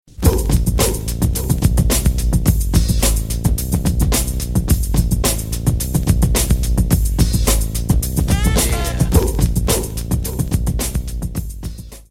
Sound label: Beat